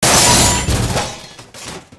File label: part bounced, tanks